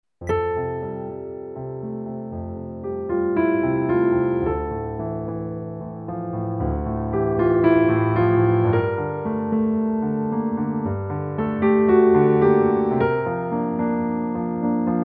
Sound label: PIANO